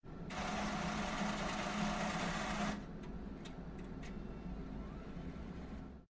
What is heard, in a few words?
Atm Cash Spin; criminality